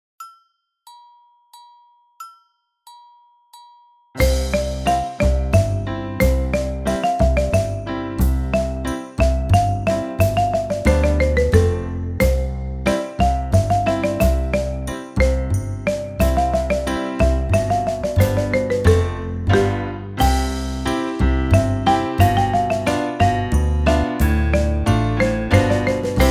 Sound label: Crazy Waltz